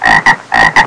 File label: Frogs; FreakyFrogs Critical Stop